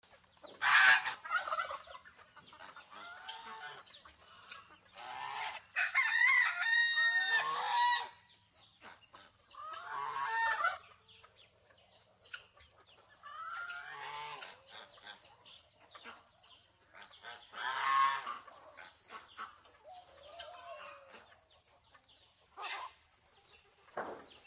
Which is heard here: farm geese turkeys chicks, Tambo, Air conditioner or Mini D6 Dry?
farm geese turkeys chicks